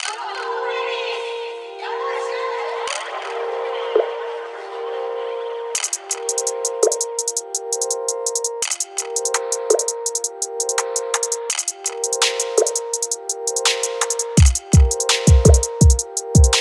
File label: Type Beat